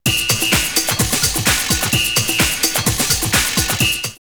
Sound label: Whistle 128, drumloops Techno House; X-Static Goldmine